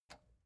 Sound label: metal button spring release